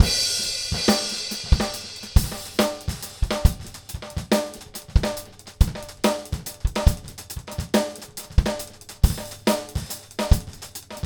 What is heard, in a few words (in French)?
batterie delay; timeless 3